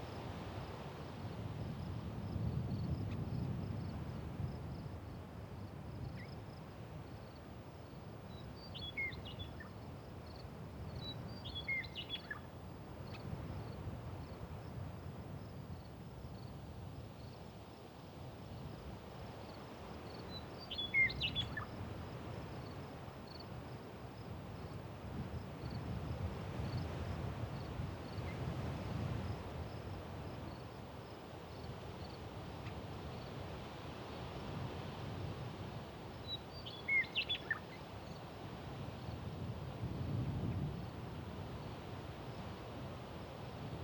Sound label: Ambience Forest